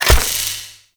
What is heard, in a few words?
Archer Rain Of Arrows Impact; Warframe; Duviri; Dax Archer; Abilities